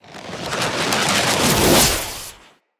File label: Siege Engine Cannon Projectile By; Weapons; Enemy; Artillery; Ode Siege Engine